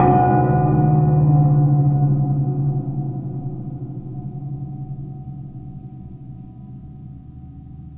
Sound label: Waterphone Mallet Slow; Sonic Foundry (Sony Creative Software) - Ambient Atmospheres & Rhythms; Ambient-Metals